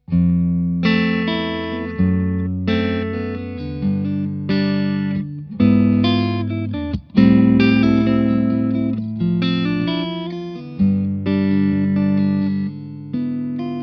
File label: Les Paul Clean; egnater